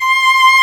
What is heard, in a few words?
VIOLIN C5